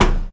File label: footstep metal low run; MONO; ProSoundCollection v1 3